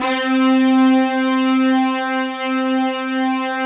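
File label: synth brass c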